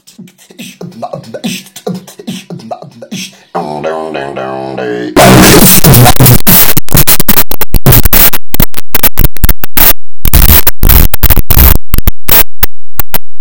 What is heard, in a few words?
chicken beatbox corrupted